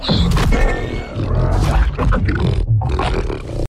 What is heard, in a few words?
Roar; Doug